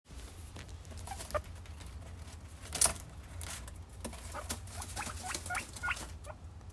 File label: begging, レムは私たちが帰る（ドアを開ける）と700 Hz程度の声で鳴きます。; 冷蔵庫の扉の開閉時や蛇口から水が流れた時にも同じ鳴き方をすることがあります。; 0歳10ヶ月8日; guinea pig; 飼い主帰宅時のレムの音声